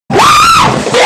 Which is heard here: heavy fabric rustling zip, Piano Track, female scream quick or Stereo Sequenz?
female scream quick